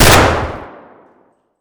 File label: mr153 fire indoor close; Escape from Tarkov